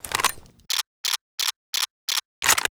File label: mosina reload; STALZONE